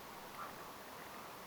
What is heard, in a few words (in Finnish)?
mika laji ehka metsahanhi